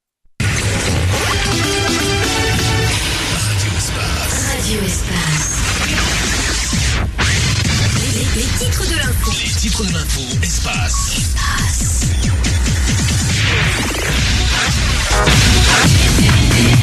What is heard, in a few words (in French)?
Medley Jingles